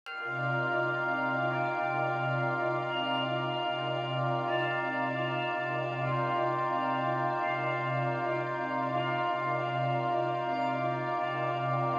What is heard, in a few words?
ambient